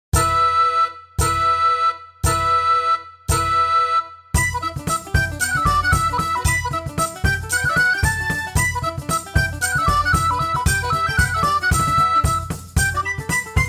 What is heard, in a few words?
Tavern; Sea Dogs To Each His Own